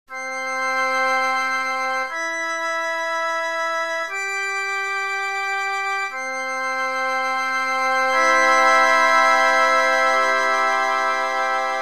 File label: interval Triad